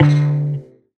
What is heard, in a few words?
tonal one shot D; Pop; Slate; PASADENA Pop Sample Pack; Tonal One Shots